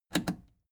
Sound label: plastic button scratch smooth click enable fast